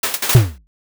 hero finisher attack

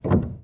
piano

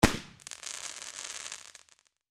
firework finish

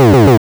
rapid attack

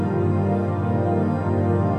pad

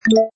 action Ok; jclic

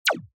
laser

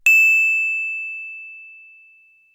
ding

